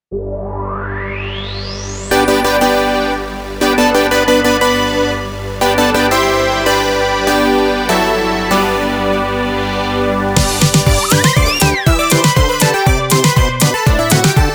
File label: Happy Happy 120